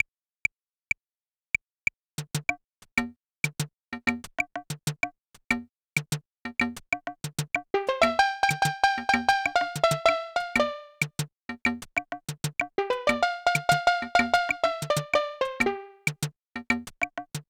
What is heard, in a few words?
Calypso, Aircheck, Retro